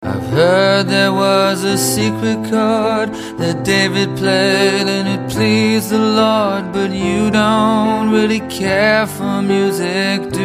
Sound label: Professional singer, Sing; Singing